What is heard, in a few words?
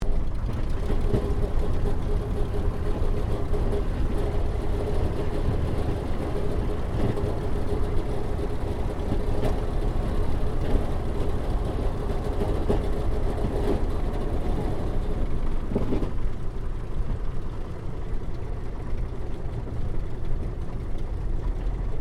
AIRCRAFT RYAN PT22 TAXI INTERIOR; 1942; Airplane Sound Effects; Airplanes (Sound Effects:Vehicles)